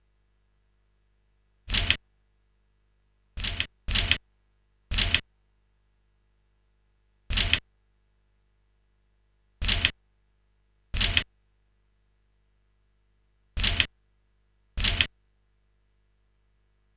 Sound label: stereo instr walkman loop